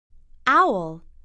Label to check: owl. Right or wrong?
Right